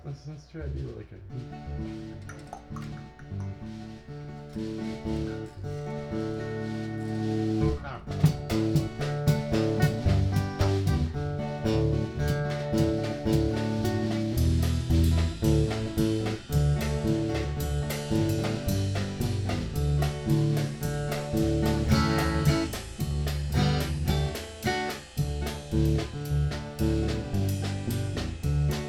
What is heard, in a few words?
Bluegrass